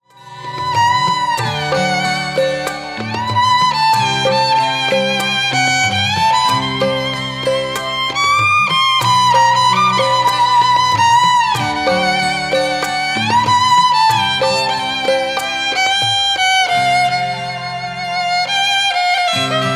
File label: Melancholy Instrumental